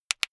plastic pressured single

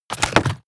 mechanical lever stone small down

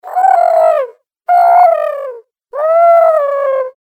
creature coo funny

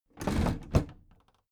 big plastic case lid put down regular

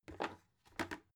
bucket handle pick up

